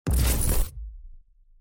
dash; umbra

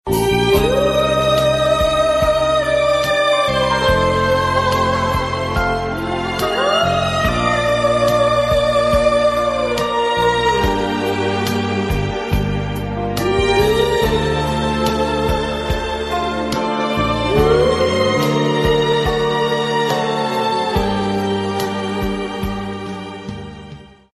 sad brother low; saint seiya

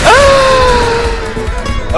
gasp

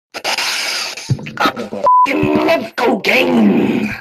snort

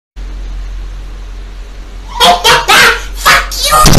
he ha ha lol

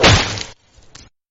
damage; scp-096

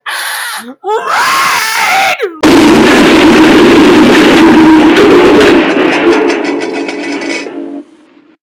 gasps raid boom

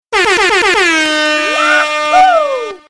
yahoo horn

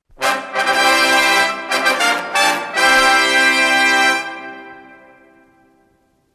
clarion call; ren and stimpy production music

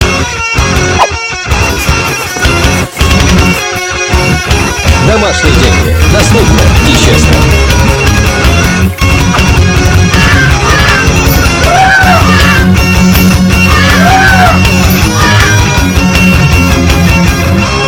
russian rock n roll; russian new years eve

